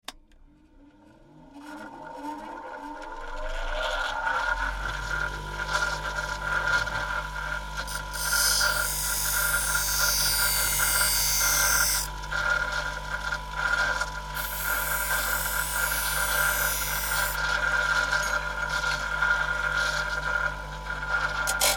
Grinding Wheel Sharpening Chisels, Electric grinder; Electric grinder start - various sharpening - turn off